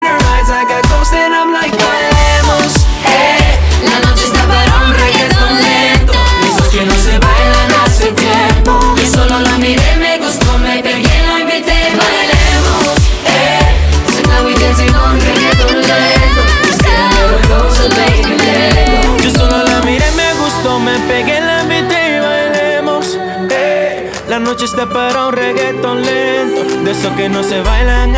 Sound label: remix